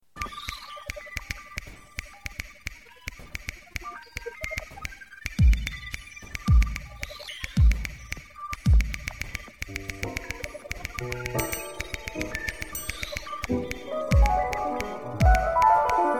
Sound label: oscillations generique